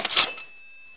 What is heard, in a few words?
knife deploy; blink